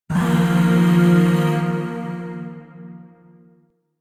Shawzin Blues Chord E; Chords; Warframe; Zariman; Void

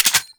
Glass Shotgun Mech; Warframe; Tenno; Weapons

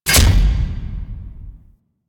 Attack Impacts; Ambience; Warframe; The War Within; Golden Maw

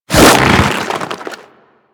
Arm Attack; Warframe; Infested; Ancient; Attacks

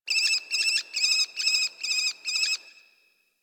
Zone Tree Critters, Ambience Plains; Warframe; Eidolon Plains; One Offs

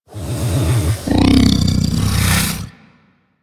Vocal Breathe Slow; Warframe; Hunting Critters; Undazoa

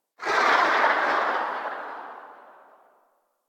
Pistol Tail; Sapient

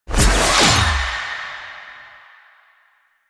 Choir Pool Cast; Warframe

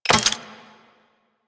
Flame Spear Charge; Grineer

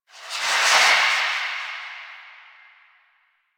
Minigame In Range; Jade Shadows; Birth Minigame

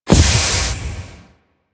Moon Organ Air Blast C; Ambience; Warframe; Orokin Moon

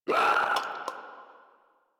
Infested Runner Hear Sound; Warframe; Runners

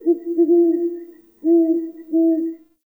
Night Creature Owl; Ambience; Night One Offs

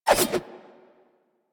Nikana Idle Swing Double B; Revenant

